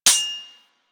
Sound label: Melee Sword Impact Generic